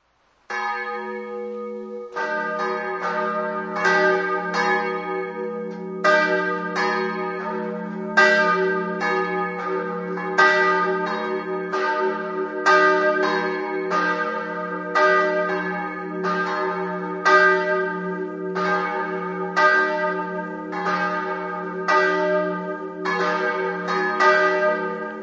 campane, monrupino

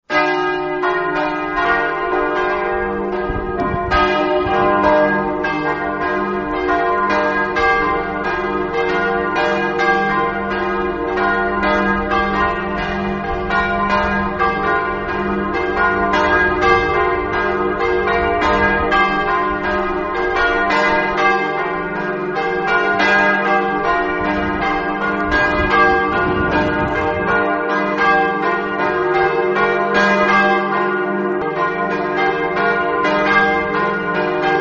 basepente, campane

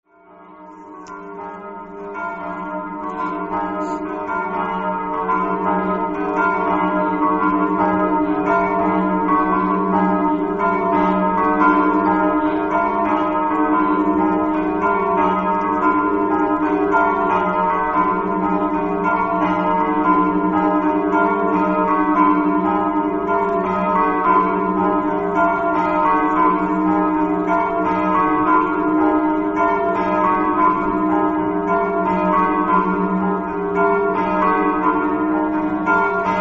lussari, campane

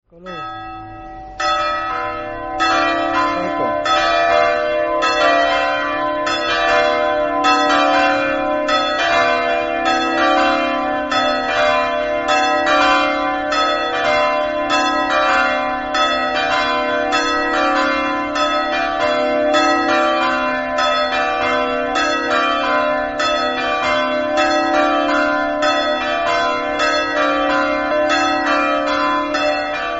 mossa, campane

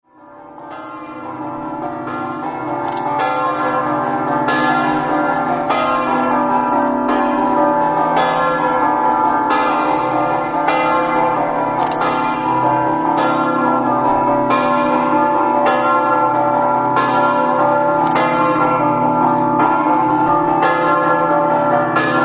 campane; premar

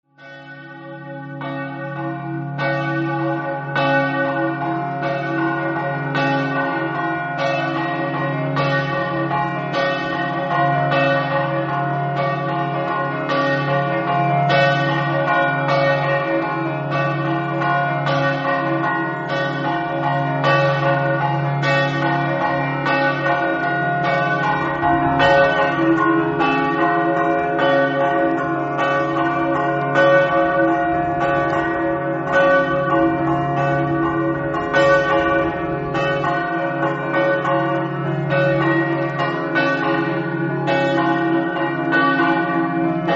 susans, campane

